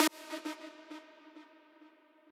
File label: lead